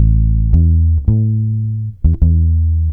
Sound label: bass, Loops